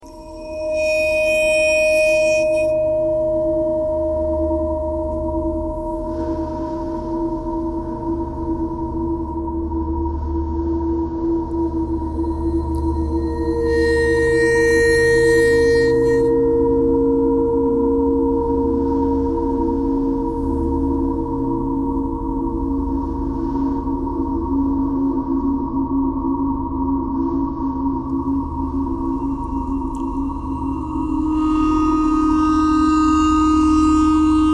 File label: ice bowed vibes traffic k core birds thread simple; bowedmetal